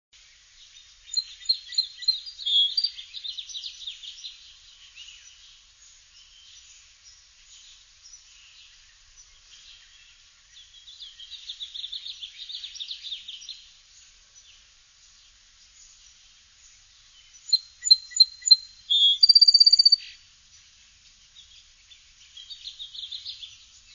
sparrow song switching, song sparrow; Centerport, NY, 3/31/02

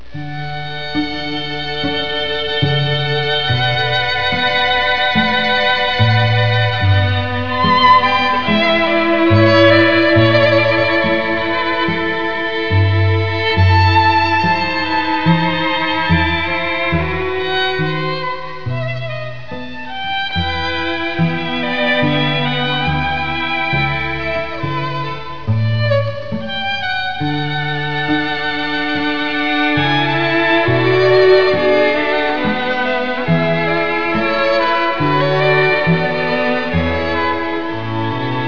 air on g string

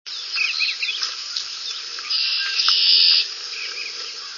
bluebird; birdsongs

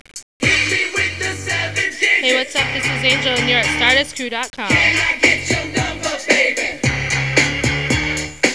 angelic

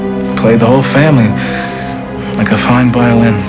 violin

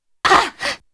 hit; 夏洛特聲音資料庫

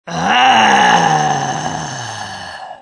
ahh; sighs; Watto

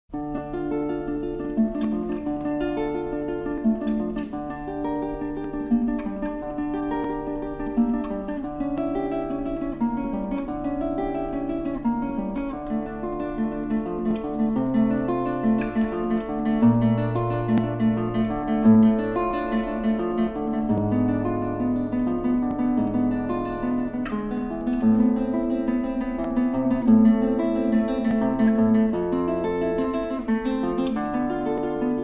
Prelude D minor; J.S.Bach

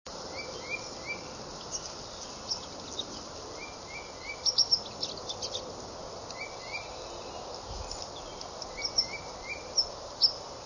sparrow WT misc sounds, sparrow white-throated; A Titmouse (loud) sings on a single note in the background.

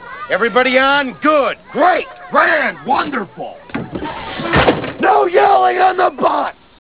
yelling

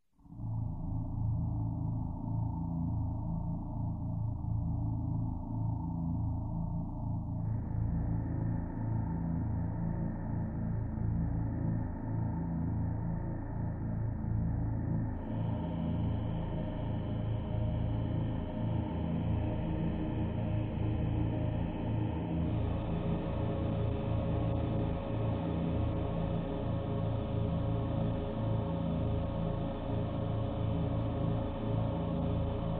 Halloween Sound Effects Spooky Night Sounds; THX

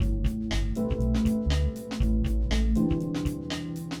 LOOP

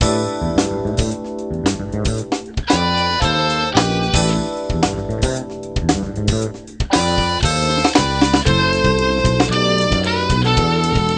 jazzrock, sax

